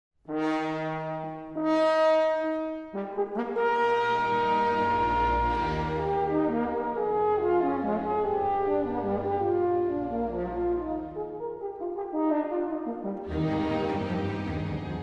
Horn Concerto, Allegro; Strauss Concertos

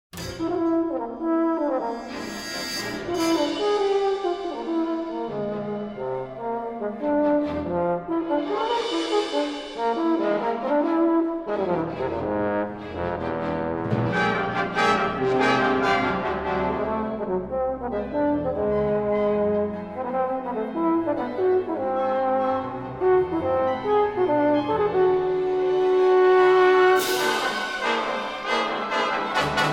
Fantasy for Euphonium and Orchestra

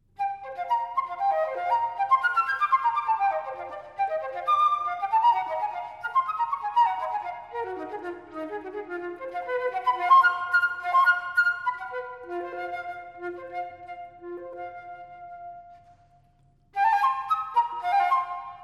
Preludes For Unaccompanied Flute, Allegro

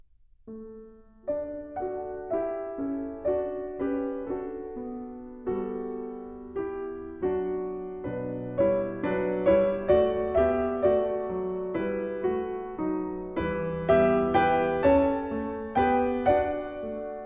Dumka For Piano; Martinů

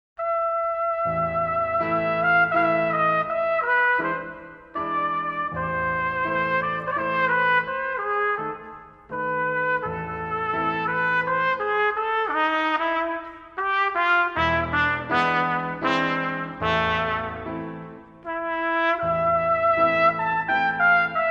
Mazurka

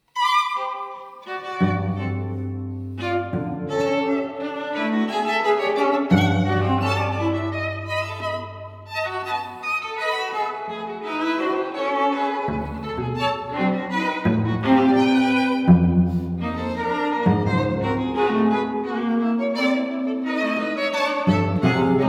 String Quartet, With Humor

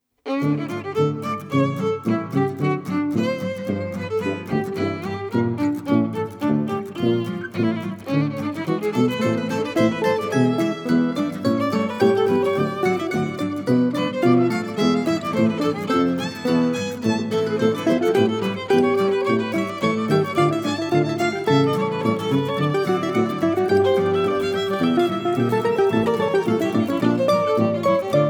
Double Concerto In D Minor; BWV 1043

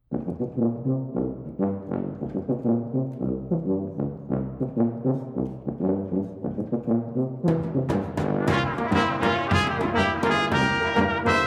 Kolo

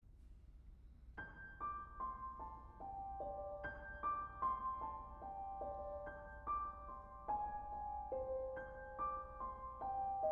for Trumpet Flute and Piano; 2. Ruby

